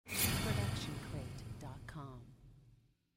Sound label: big sword impact